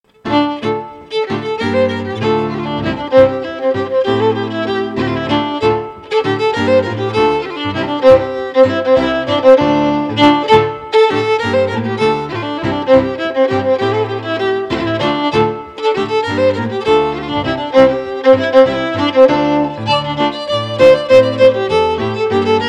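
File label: Scottish Fiddling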